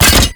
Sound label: Pistol Dry Fire; Warframe; Scaldra Pistol